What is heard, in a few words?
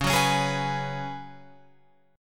C sharp 7th Suspended 4th C sharp, Guitar Open F; C#7sus4; strummed; tuning=Open F; guitar chord